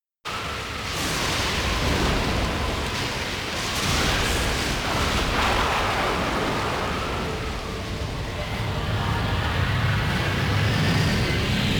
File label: Logo (Xbox Version); Team Ninja